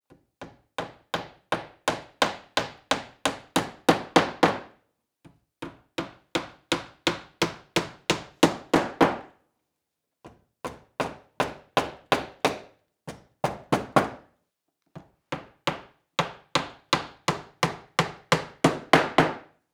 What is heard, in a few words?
hammering nails; mechanical